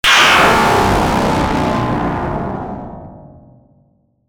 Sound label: Impact Static Blast; bottlerocket